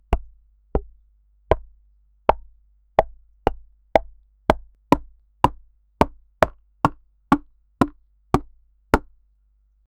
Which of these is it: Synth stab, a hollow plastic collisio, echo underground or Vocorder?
a hollow plastic collisio